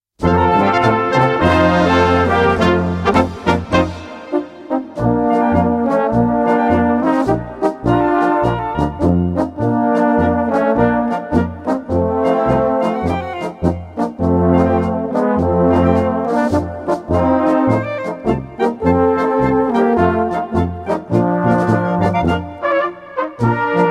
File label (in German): Polka